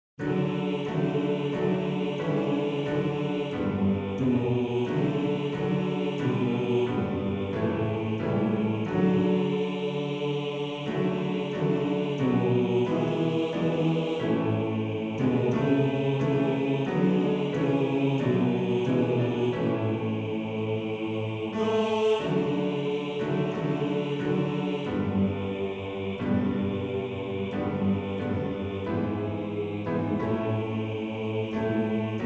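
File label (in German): Motette Bass 2, Männerchor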